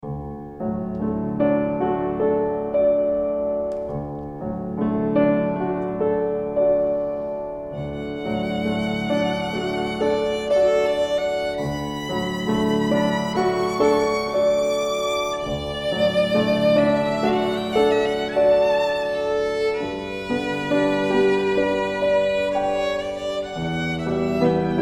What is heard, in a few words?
Meditation